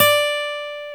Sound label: GTR D5A, Dual Guitar, Ac 12+; Club-50 - Foundations